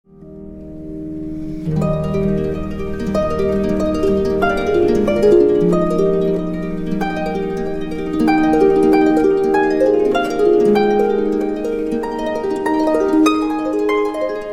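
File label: Fairest Lord Jesus, harp solo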